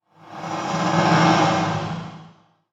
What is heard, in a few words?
Roar